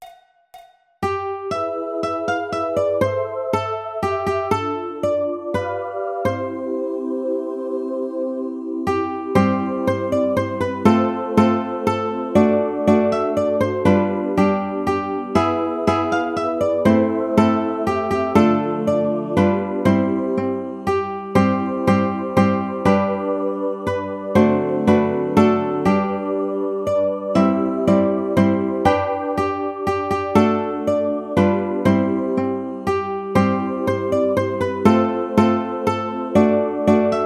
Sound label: We Wish You A Merry Christmas Ukulele